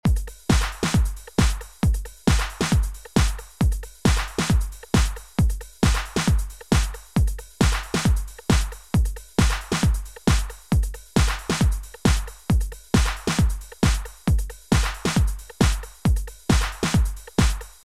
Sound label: music menu; Nickelodeon - Back to School Search